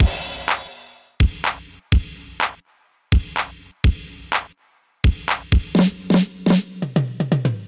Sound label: 125 Breakdown, Drum Machines; LD Gabe; Ableton